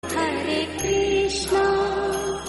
Hare Krishna Chant Ringtone

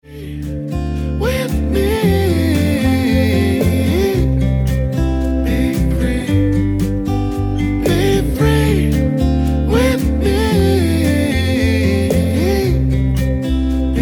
Acoustic Version Ringtone

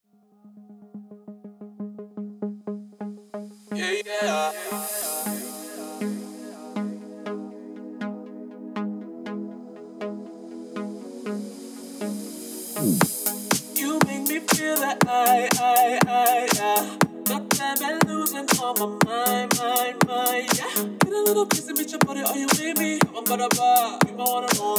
Original Mix Ringtone

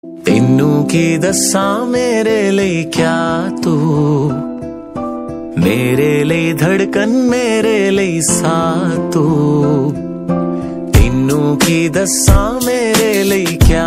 Romantic Ringtone

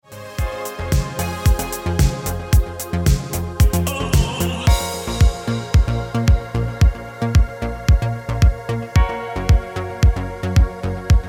Remix Ringtone

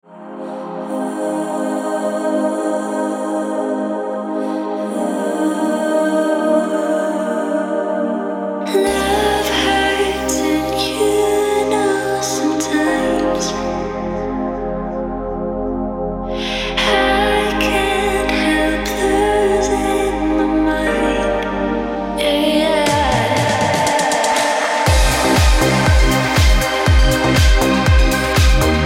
Ringtone

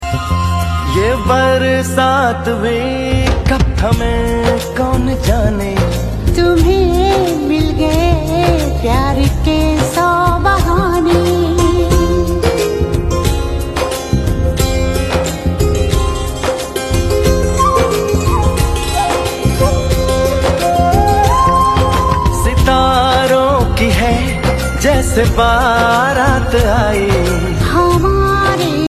Ringtone

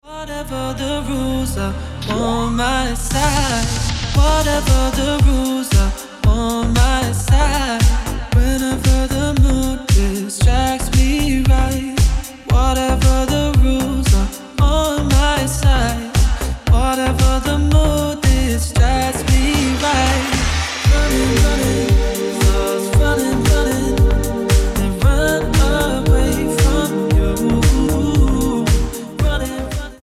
Ringtone

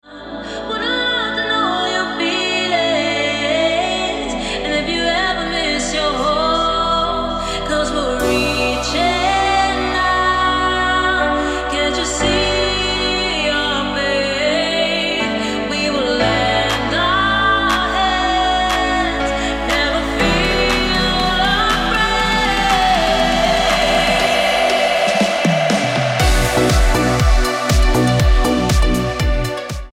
Ringtone

